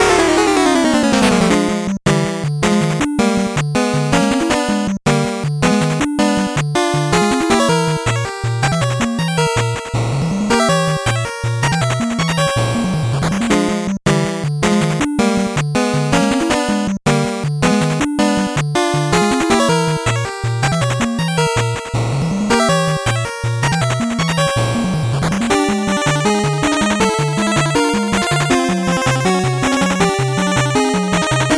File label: 8bit bgm slow